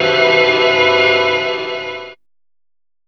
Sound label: DR. LOOP, TRANCE; X-Static Goldmine 3